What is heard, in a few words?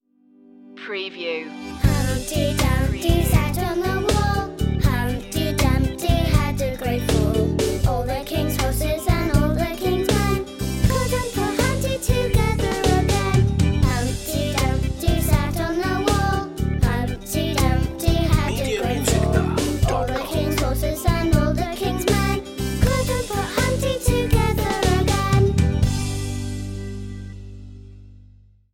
humpty dumpty children vocals short